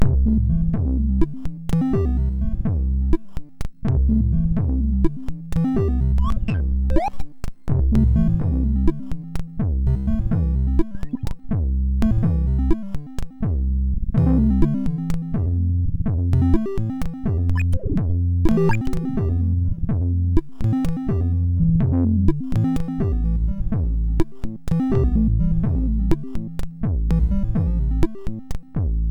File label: note sid MOS6581R4; Birds on Fire